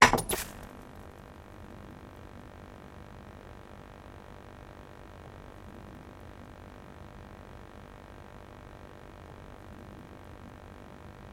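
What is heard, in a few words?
electric garland sounds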